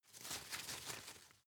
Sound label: shake soft; berufe; foerster